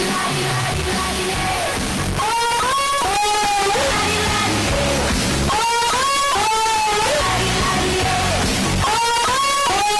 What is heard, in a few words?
bass boosted